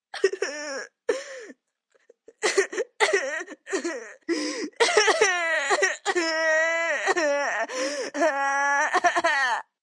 Crying Coughs, Crying, 1 child, boy, long, sobbing, human voice, kid, male